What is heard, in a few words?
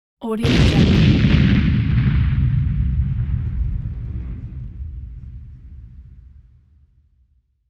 explosion; انفجار مهیب